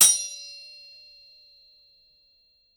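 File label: Samurai Impact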